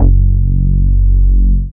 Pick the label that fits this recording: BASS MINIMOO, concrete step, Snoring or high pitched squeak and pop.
BASS MINIMOO